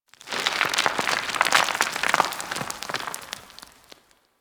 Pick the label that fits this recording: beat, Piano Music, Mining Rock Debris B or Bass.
Mining Rock Debris B